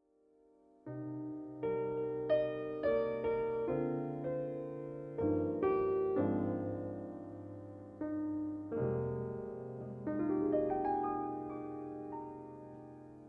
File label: piano sketches